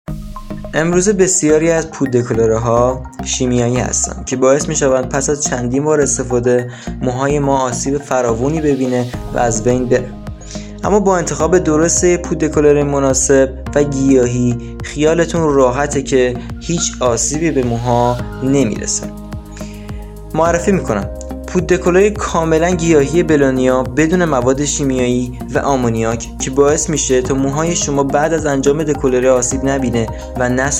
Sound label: trance